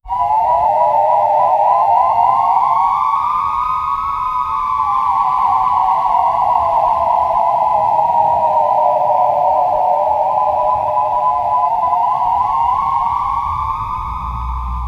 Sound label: faint sirens in distance, police